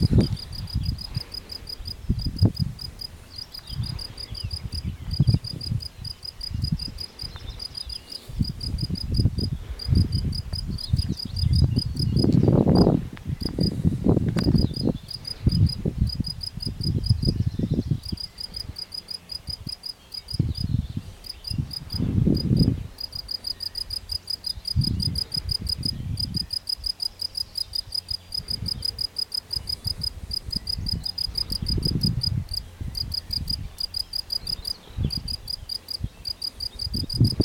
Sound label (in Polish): swierszcz polny; Krepa; OrzechowskiR